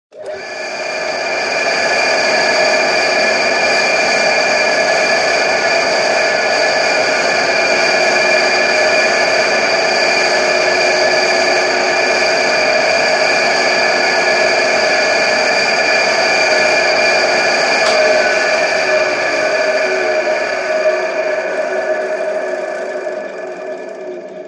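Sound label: sounds of lathe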